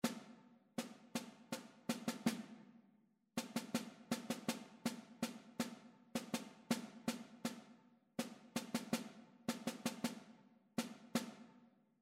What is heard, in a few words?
rhythmic error detection